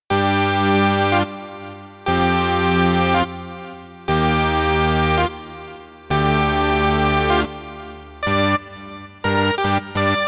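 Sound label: sequence panning lfo; the transmitter soundset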